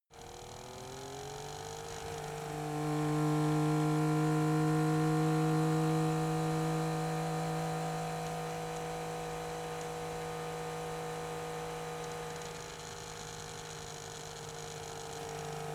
car saab 92 drive low engine

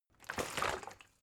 bottle water sparse upend soft; berufe

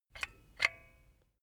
silicone gun bullet lock mechanism regular; berufe; handwerker; soundpool

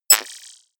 sci-fi bleep; maschinen; signale; soundpool

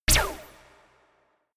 space laser gun short silencer; soundpool

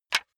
wood board small drop; objekte; holz

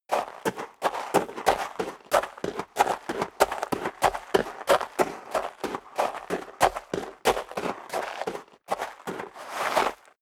step dress boots gravel light walk sequence; schritte; Footsteps; menschen

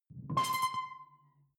sci-fi alien console high; maschinen; signale; soundpool

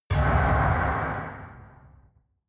metal large hard

